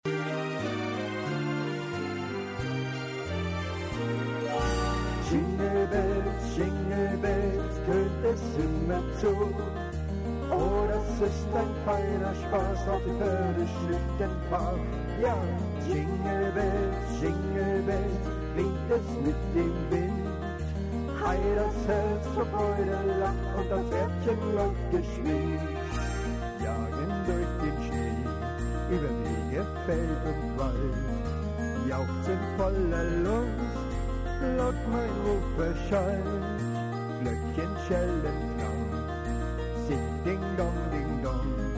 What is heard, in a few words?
Jingle Bells